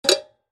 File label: Teapot Lid Open Metal Tea; Household; Foley Tea Kettle; Dishware